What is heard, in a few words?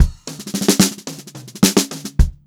109 groovy fill 3, funk groove drumloops; USB Soundscan vol.38; AKAI